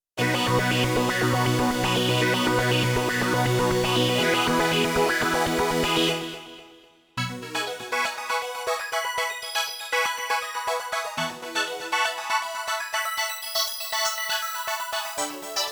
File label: Dimension, Korg Radias-R